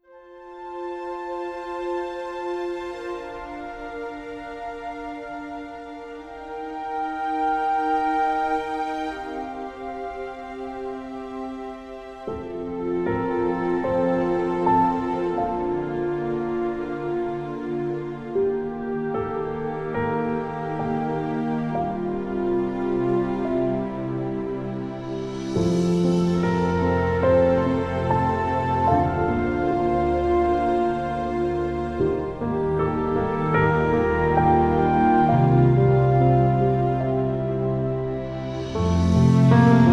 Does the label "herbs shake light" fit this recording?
No